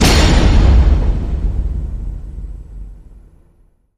Hit Big Basin

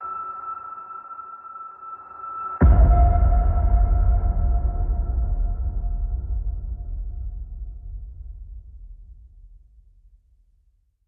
Hit Distant Ping

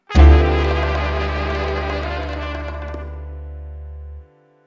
Hit

